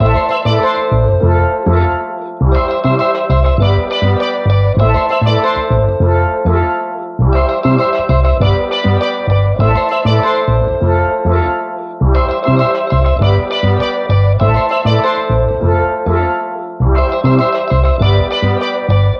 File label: melodic loop A m